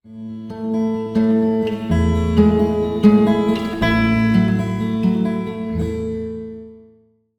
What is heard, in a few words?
desktop logout